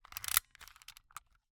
Camera, Kodak Extramax, pocket, 110 film, plastic, metal, advance film, slide lever; Eiravaein Works; Vaeyan IV